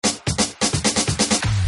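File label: Best Short Msg Tone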